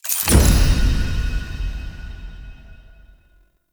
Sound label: Node Select; UI; Warframe; Tau Mission Menu